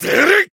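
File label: attack, Japanese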